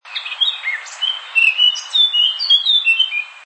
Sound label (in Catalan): tallarol casquet